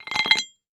Sports Weight Lock Unscrewing On Metal Weight Lifting Bar